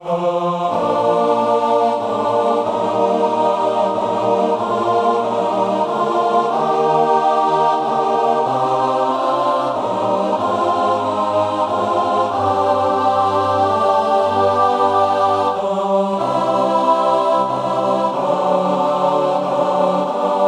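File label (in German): Männerchor vierstimmig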